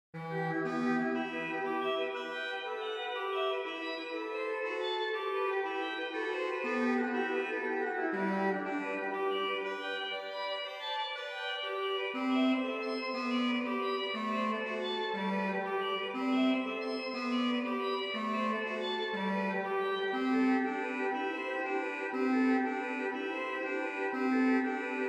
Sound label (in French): Gloria Duo Allegro, OrganMusic; Beauvarlet Charpentier Journal; Souvigny